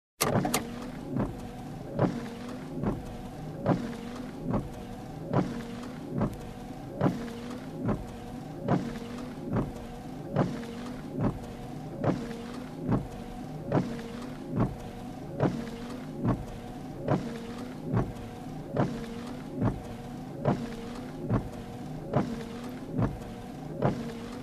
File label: Car Windshield Wiper